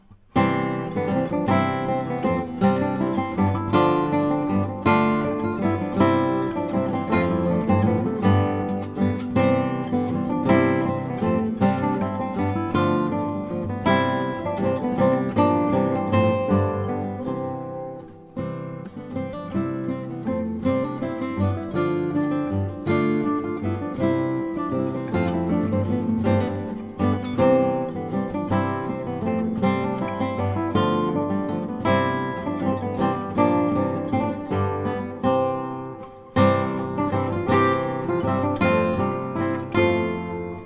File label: Basse Dance; No.2 (Bergerette Sans Roch); Tielman Susato